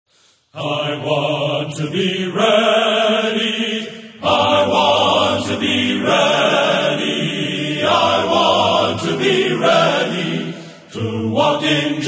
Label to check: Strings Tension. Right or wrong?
Wrong